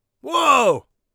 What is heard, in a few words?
whoa, warrior voice; Warrior Voice Pack